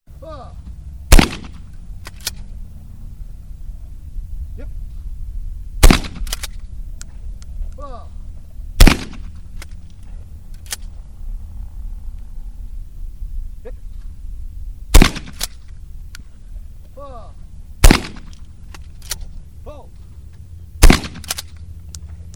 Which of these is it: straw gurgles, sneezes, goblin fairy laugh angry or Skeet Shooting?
Skeet Shooting